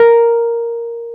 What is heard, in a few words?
PNO, Roland Keys of the 60s and 70s, Wurly; Roland LCDP10 Keys of the 60s and 70s 2; Wurly 200